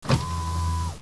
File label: iron